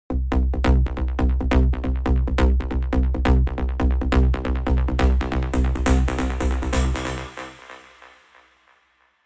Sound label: Trance Fury, techno bass; Spire; revealed spire techno bass vol 1